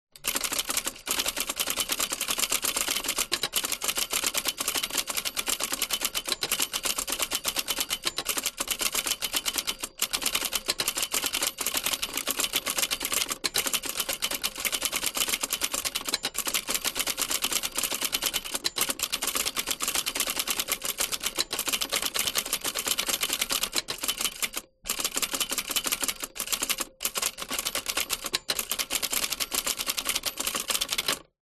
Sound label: office typewriter typing fast rear close vintage 1960; lemair helvetia